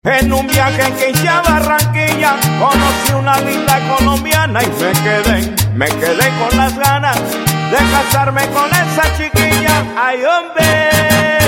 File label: Latin Band, Cumbia